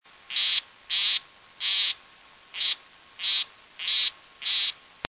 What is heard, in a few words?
Oophaga Granulifera; Canto; Dendrobatidae